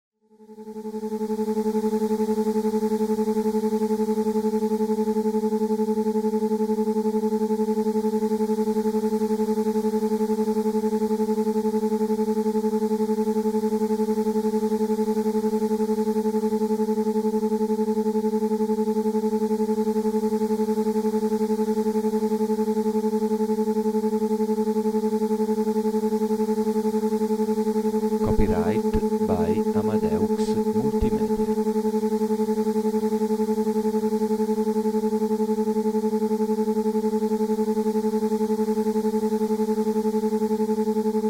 alpha binaural

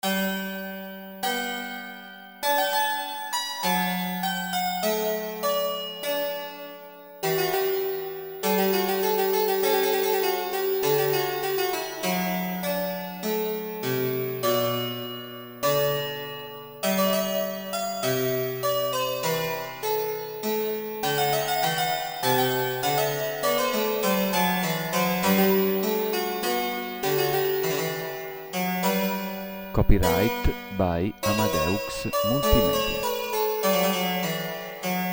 Bach Goldberg 988 aria 432hz

